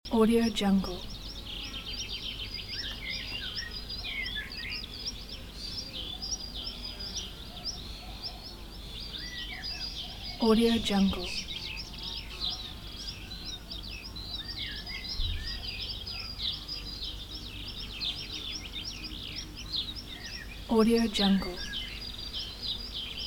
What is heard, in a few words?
summer european countryside ambience loop